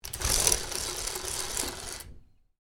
Roller blind down, Roller Blind: Domestic, Roll Down With Cord; Household; Miscellaneous Household Sound Effects; Miscellaneous Household (Sound Effects:Household); Stuart Duffield